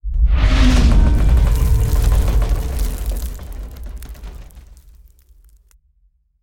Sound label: Rumble Stinger; silent hill homecoming 2008 gamerip pc ps3 x360